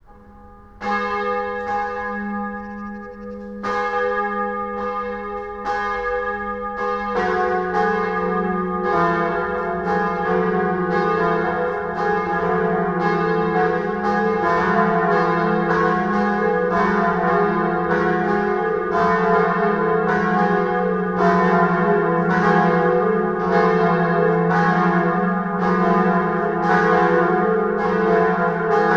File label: BELLS 2MIN, Cathedral; Propeller Island - Cathedral Organ